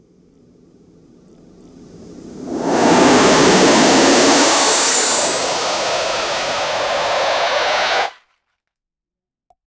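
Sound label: jetpack take off full throttle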